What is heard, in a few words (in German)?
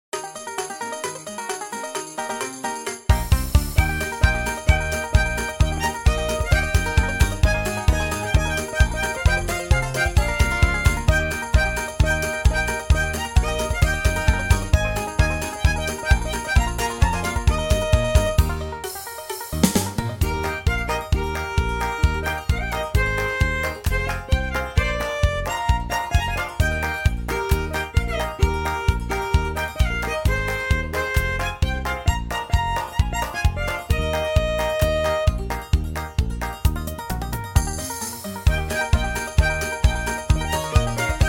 weihnachtslieder jingle bells country